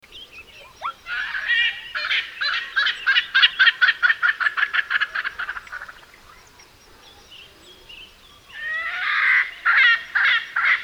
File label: Erckels Francolin, songs